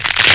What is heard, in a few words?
CRUNCH; TOYLAND